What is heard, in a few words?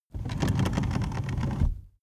car seat slide backward slow rattle